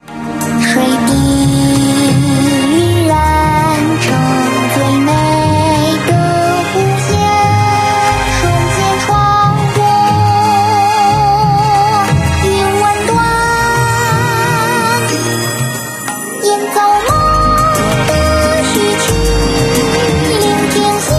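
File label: background music crossfade; Invaxion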